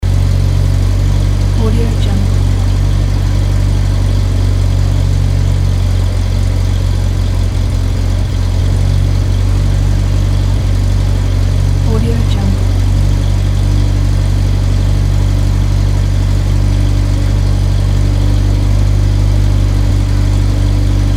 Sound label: old car engine